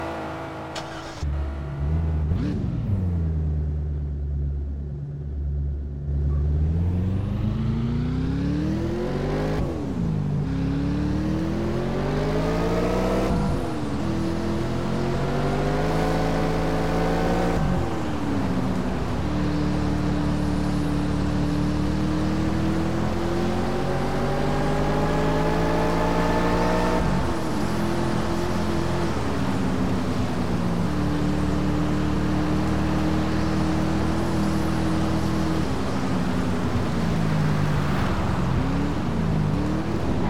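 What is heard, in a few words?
cadillac xlr-v, car; TDU-1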